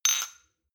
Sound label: shot glass clink bounce medium; soundpool